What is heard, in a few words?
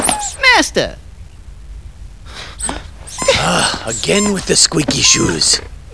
squeaky